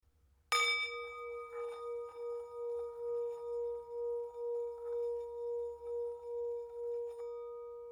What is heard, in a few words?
Tibetan Bowl